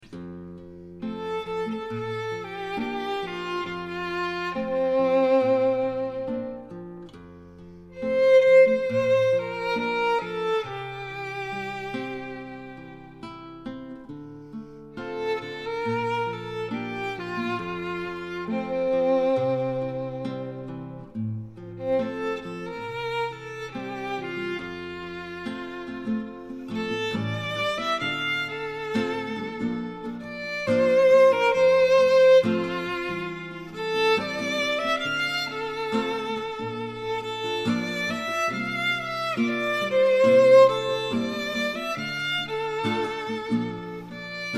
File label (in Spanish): guitarra y violin